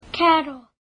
cattle

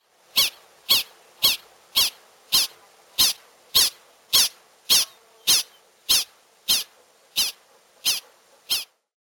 prairie

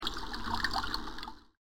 Footsteps In Water

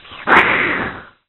hiss

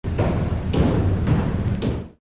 Walking Down Hall

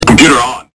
COMPUTER

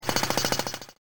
Jackhammer

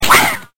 cat

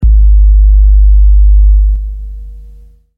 808 long kick

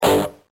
electric guitar

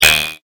BUZZ

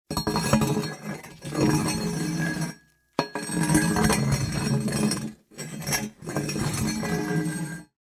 fireplace cleaning

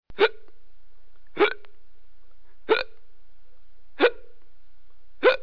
Hiccups

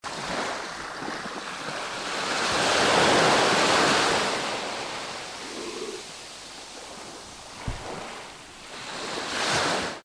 leatherback into waves

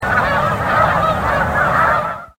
snow goose

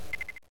TURB BEEP

